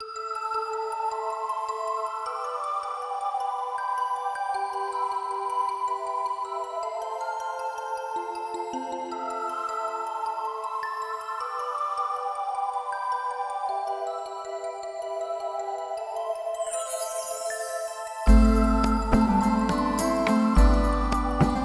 arctic groove musix; wob2 demo

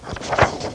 Page Turning

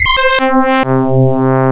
Wabber Strings

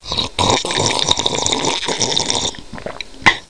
Slurp Drink; Education Publishing Concepts

